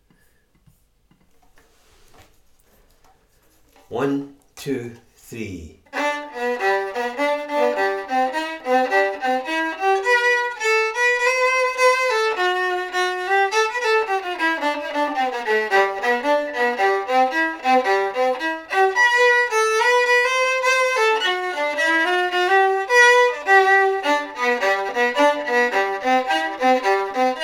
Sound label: Copshaw Hornpipe; slow jam